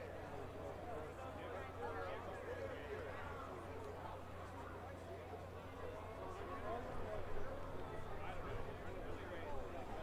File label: Set 1 Intro; mk41